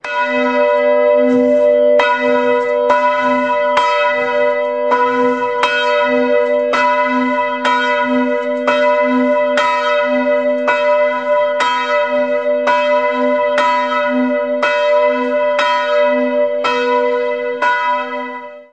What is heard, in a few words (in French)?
cloche